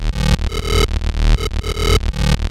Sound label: SH101 LOOPS; Syntec; Wall of Sounds; TECHNO-BOX